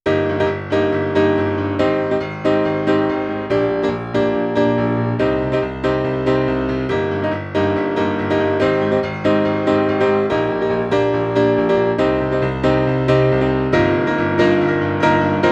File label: dry piano; enhancer vintage 73; noshigesound